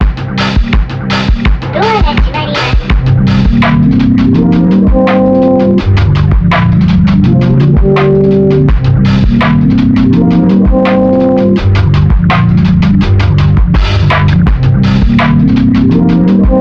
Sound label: LoFi Hip Hop